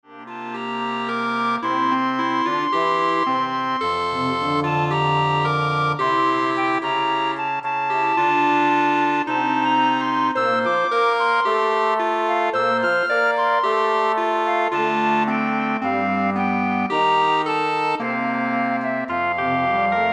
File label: Woodwind Ensemble